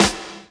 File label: Snares & Claps; Vengeance Samples